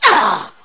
pain; invis

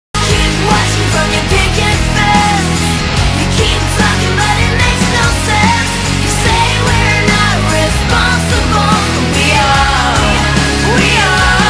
human win; zombie plague; cso

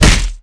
claw hit; oblivion darkangels

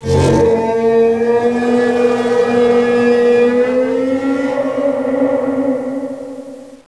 teleport

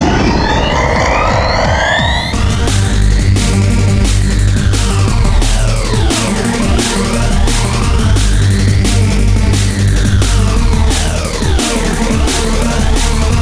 human win; zombie plague

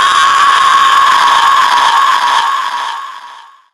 Siren Scream; zombie plague

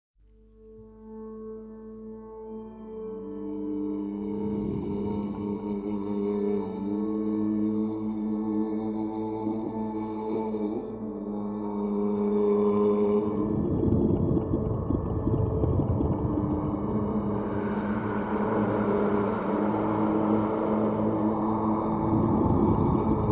ambience, zombie plague

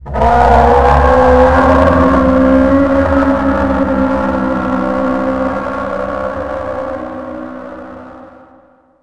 locust; cstrike; War3

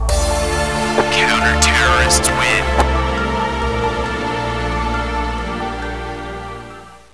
humans win; zombie plague

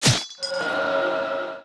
ice arrows; cstrike; War3

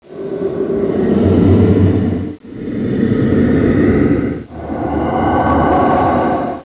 monsters idle; oblivion darkangels

